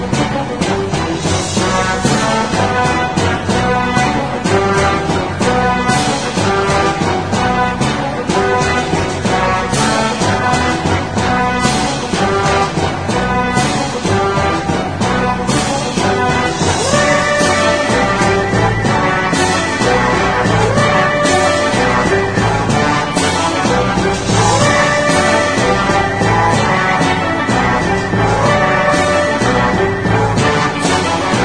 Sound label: baddie tune; Platypus